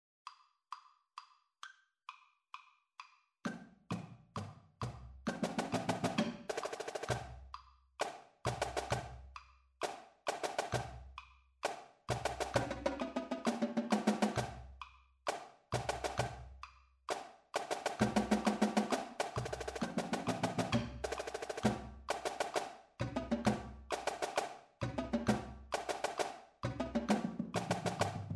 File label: drumline only